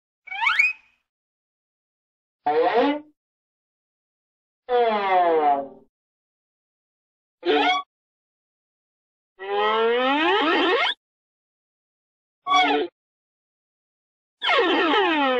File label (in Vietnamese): tong hop tieng huyt sao coi truot hoat hinh